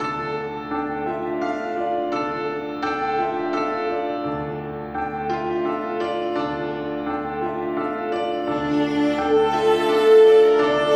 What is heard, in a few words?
Solemn City loop; Beastmancer